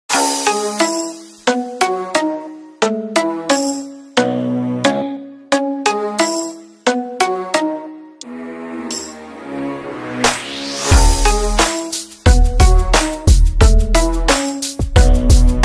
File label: instrumental ringtone